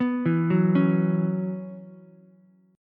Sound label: F sharp 7th Suspended 4th, Ukulele; F#7sus4 arpeggiated